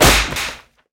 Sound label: backfire short; crc2005